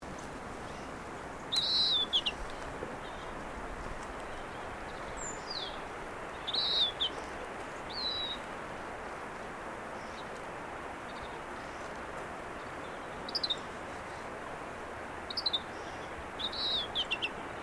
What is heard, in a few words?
yellow-breasted greenfinch